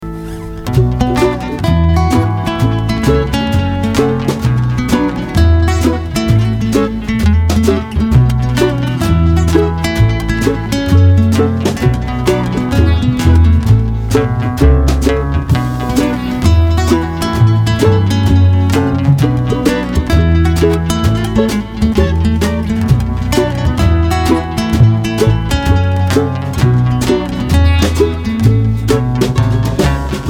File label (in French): Rag des champs